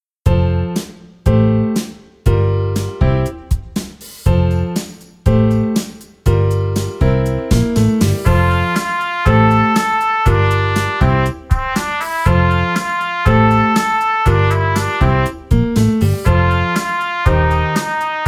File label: Trumpet; easy beat vol 1 trompete